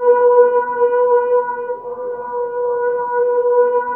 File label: GLISS LOOP, AMBIENT LOOPS; Zero-G Ambient 1